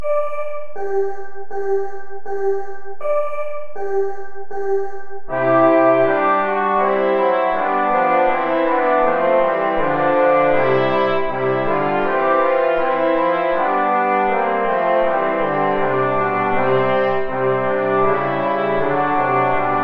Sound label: play along without trumpet